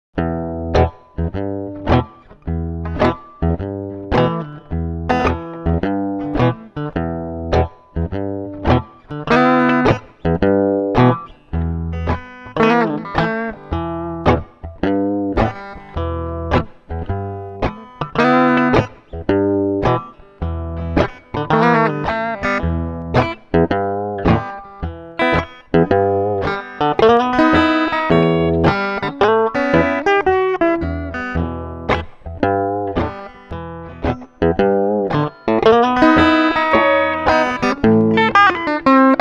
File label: Electric licks, riffs; Clair avec riffs d'entrée